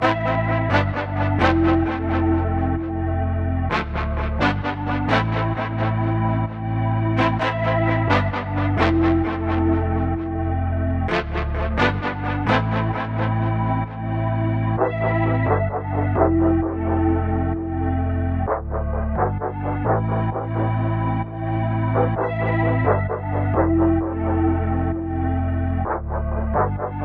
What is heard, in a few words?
130 BPM A Min, Trap Melody Loops; Champ; Cymatics; Nightmares